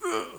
Ouch

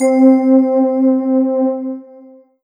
Roland SC-88 New Age Pad C4

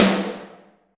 Yamaha RM50 Snare Drum

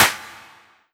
Slap; Korg N1R

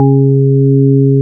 Yamaha DX7 E Organ C3

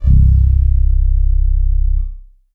Korg DS 8 Bass C3

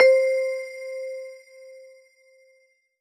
Roland D-20 Joyful Times C5

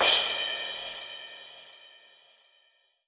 Yamaha TG100 Crash Cymbal

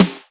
Roland R-8 Snap Snare

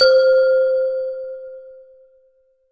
Roland JV-2080 Crystal C5

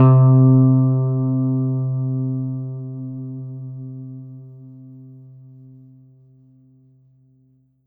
Korg N1R Hollow Body C3

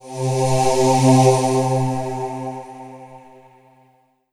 Yamaha TG500 CH Aah C3

